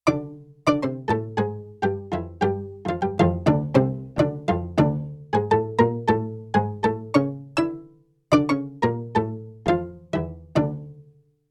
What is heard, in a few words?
For Pop Music, Pizzicato; Yamaha TX16W